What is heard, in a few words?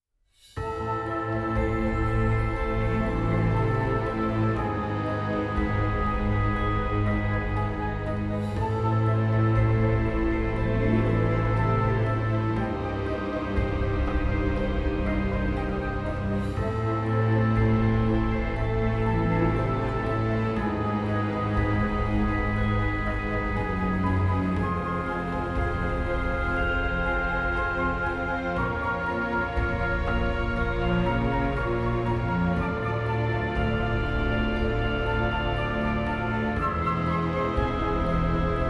BALLADE; Wakfu Symphonic